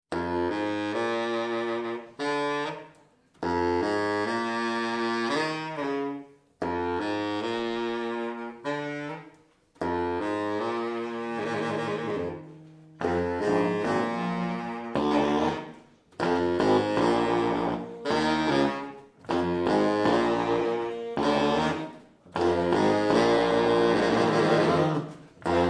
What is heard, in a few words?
SAX MAFIA